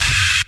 shwrr; Zaps and Beeps

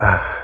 ah

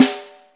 snare, Real

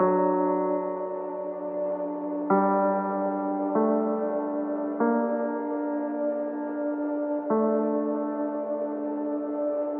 piano, Sad

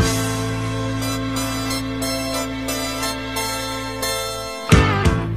horns, Loops

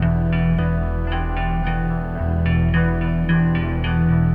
guitar, Loops, Sad

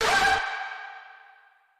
"ah", robot; Voice; ooh ahh

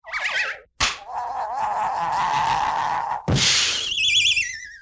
toon slaps

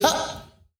ha

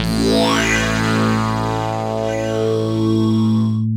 growl, synths; Notes

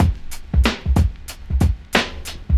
head nod, Drum loops Boombap

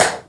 lien, Snares Electro

